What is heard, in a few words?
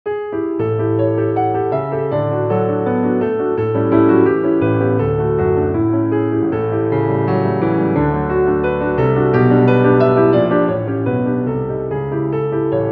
piano; schumann